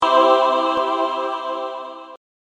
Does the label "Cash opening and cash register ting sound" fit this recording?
No